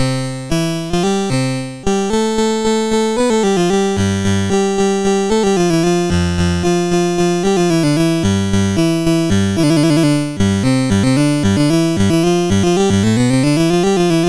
Bach, CSG8580R5; BMV977

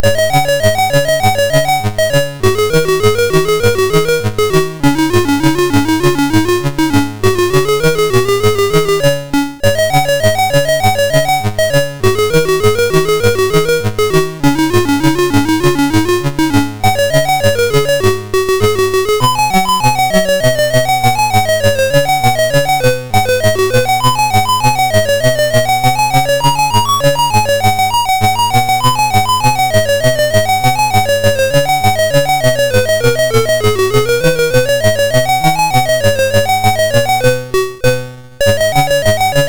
Boing sid MOS6581R2; hvsc

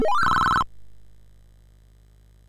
sid, MOS6581R4; Classic Concentration

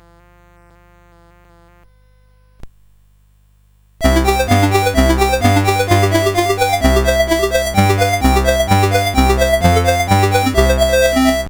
First Duet, CSG8580R5

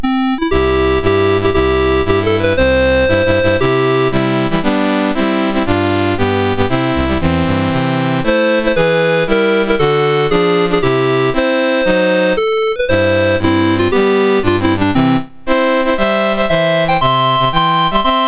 MOS6581R2, National Anthems; Mexico

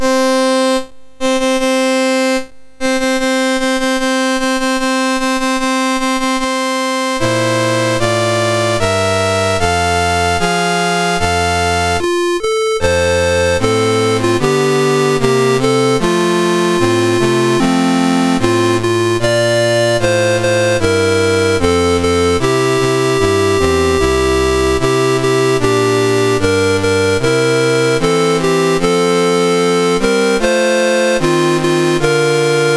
MOS6581R2, National Anthems; Guatemala

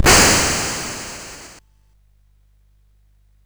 sid CSG8580R5

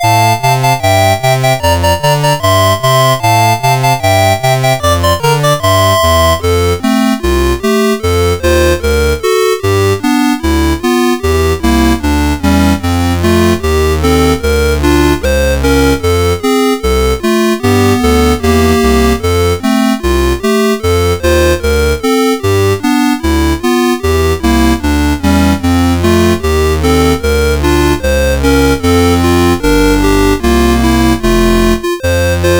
Christmas Carol, CSG8580R5

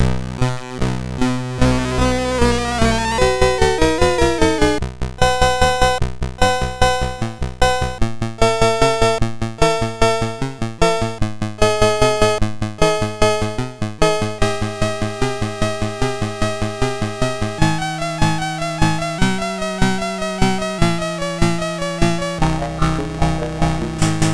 Music, MOS6581R4; Colleen Music Creator

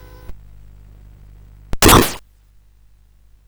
sid CSG8580R5; California Games

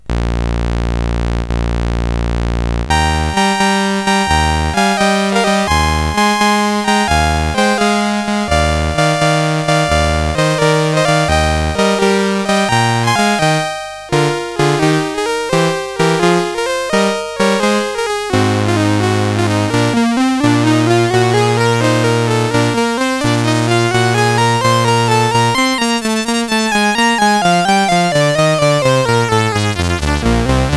Opening Chorus of Prirates and Solo, CSG8580R5; Pirates of Penzance

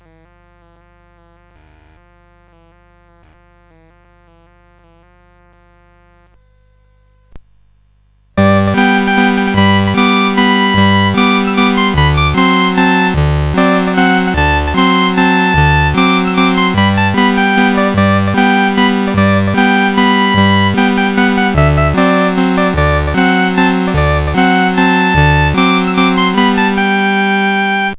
La Cucaracha, CSG8580R5

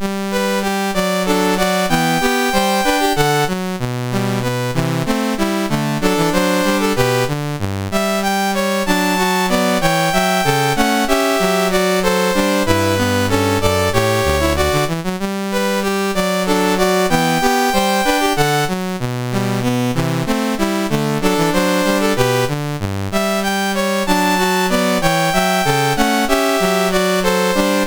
Variation, MOS6581R4; Goldberg Variations

